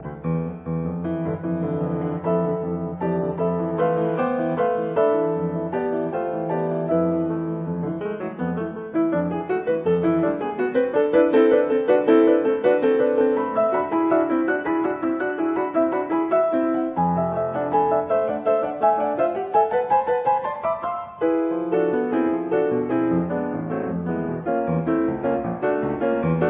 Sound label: Piano Sonata